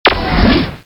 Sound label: Wave